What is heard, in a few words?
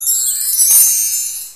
chime sms; ringtones